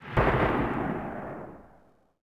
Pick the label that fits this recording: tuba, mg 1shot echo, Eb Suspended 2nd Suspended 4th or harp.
mg 1shot echo